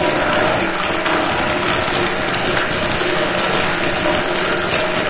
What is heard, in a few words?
gel through vent loop; Source Engine; Portal 2